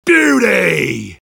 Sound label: sniper cheers; vo